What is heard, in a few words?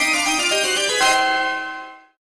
tombstone